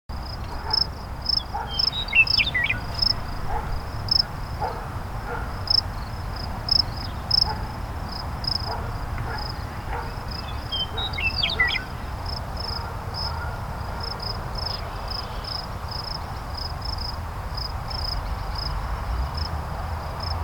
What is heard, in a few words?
Ambience; Pro Stroke Golf World Tour 2007